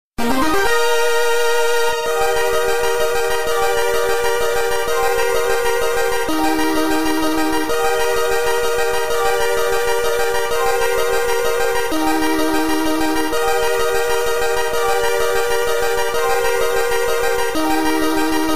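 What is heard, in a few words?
Medal level up, gamerip; Medabots RPG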